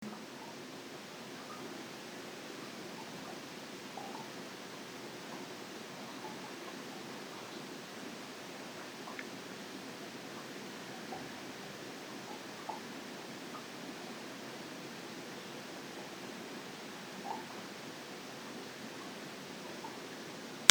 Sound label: xylo